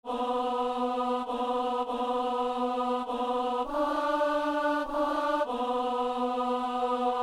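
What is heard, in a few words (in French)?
refrain pour l'élévation ténor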